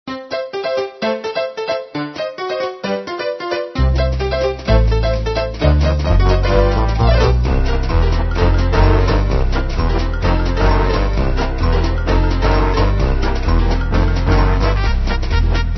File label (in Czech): hudba; puffleparty